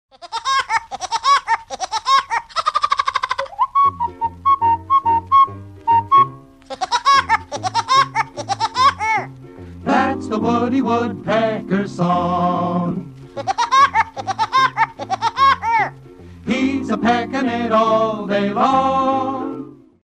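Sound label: sounds of woodpecker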